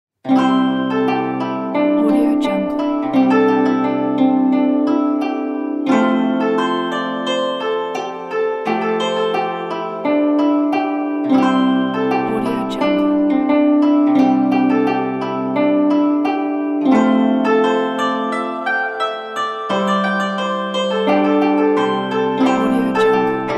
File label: guzheng solo